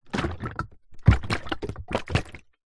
industrial power steering fluid in plastic bottle short shake movement